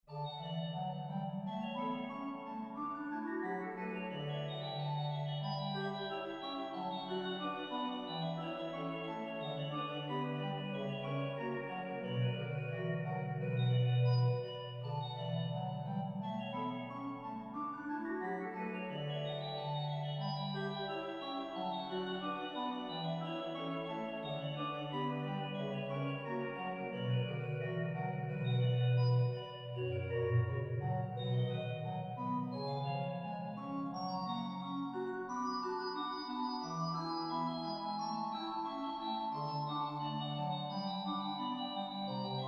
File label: miniatures, organist